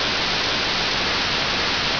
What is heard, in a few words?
fuse